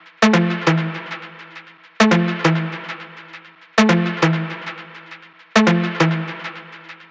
Synth Loop A 135, Techno; Ghosthack; AC2024 - Day 1 - Techno Sounds 2025

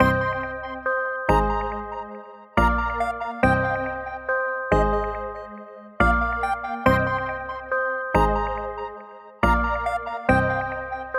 Happy Melody Loop - 140 BPM C Min; Cymatics; Oracle Sample Pack

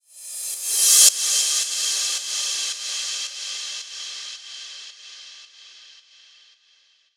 Reverse Cymbal 110Bpm; House; Ghosthack Advent Calendar; House Kit; House Construction Kit

